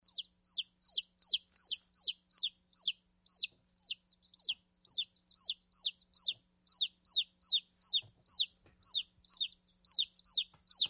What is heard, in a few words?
Chicks Peeping; chickens